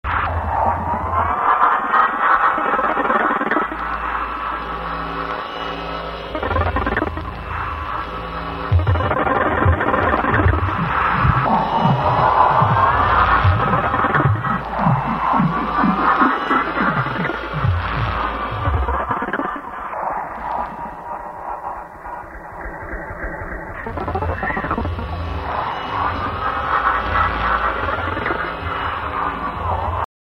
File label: Drone